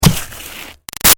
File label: Snow Impact Thump Icy Scrape; Weather; Big Room Sound